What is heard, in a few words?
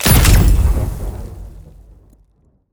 sand trap